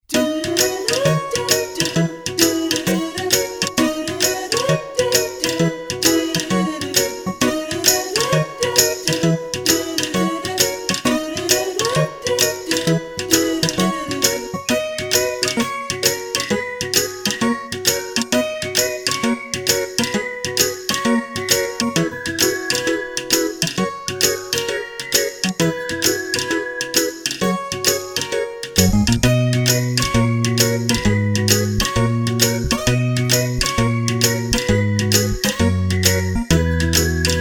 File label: Retro Groovy whimsy whistles and vocals